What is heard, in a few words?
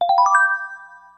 spinsound; Blingo 1.2